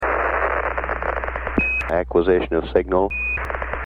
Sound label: acquisition of signal